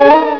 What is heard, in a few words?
Ice; Bombzuka; Retro